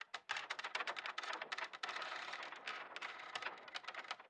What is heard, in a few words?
ambient; World of Tanks